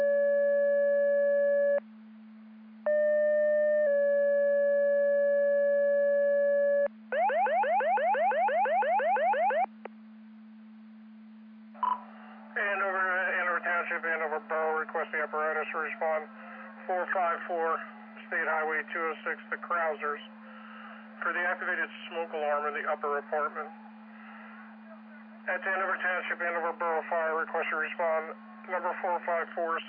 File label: Siren; Andover Twp Boro